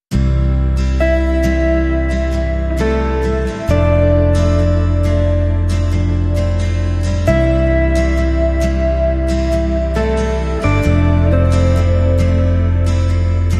Calm Tide No Drums

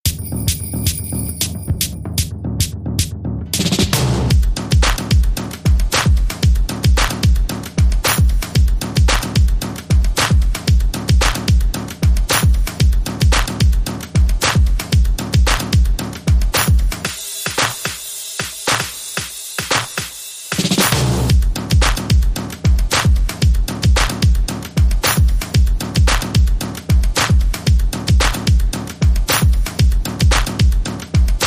Modulate Beat

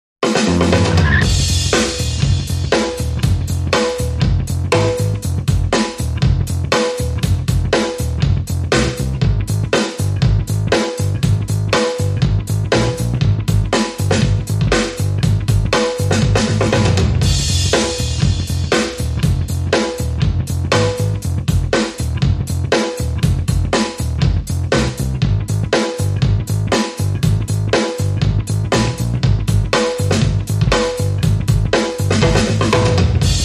Drums and Bass Mix

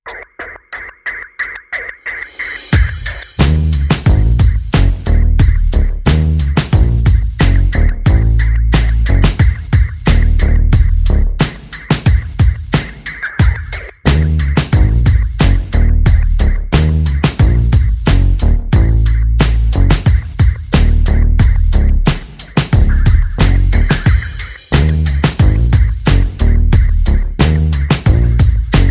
Bass and Perc

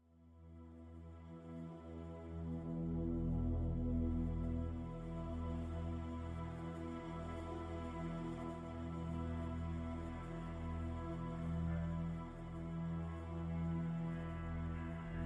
Pads Only

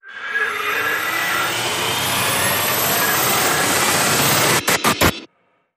rise short

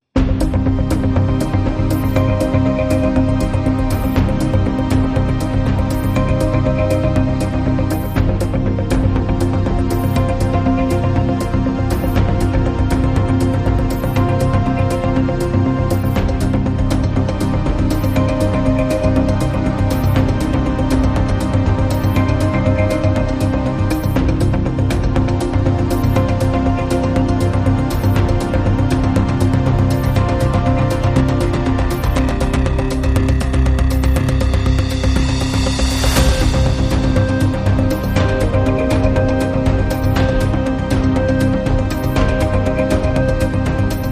No Kick Drum

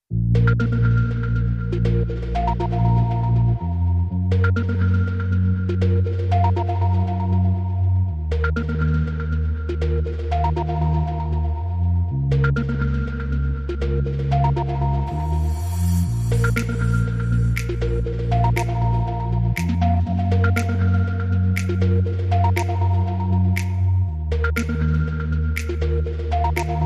Underscore

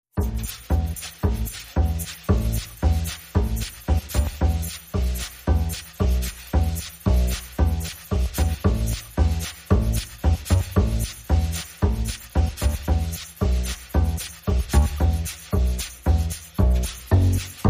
Irish Pub, Percussion and Bass

